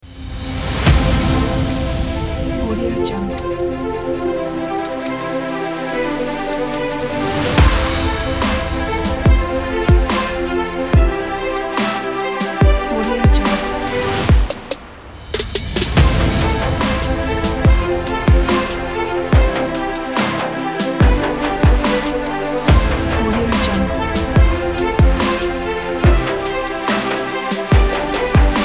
epic electro

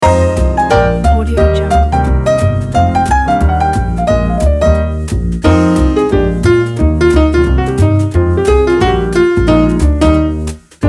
christmas jazz kit

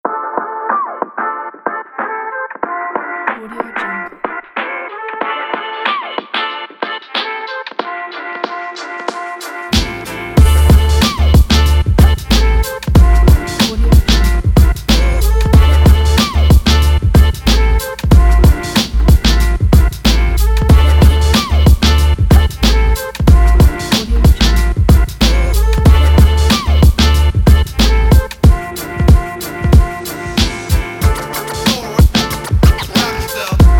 hip hop

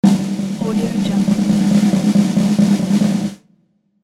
drum roll; floraphonic

